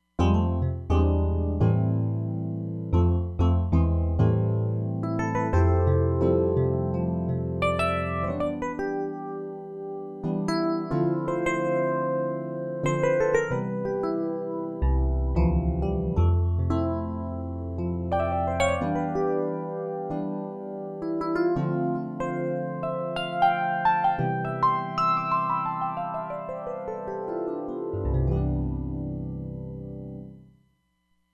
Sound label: 1983 EP, Roland Fantom XR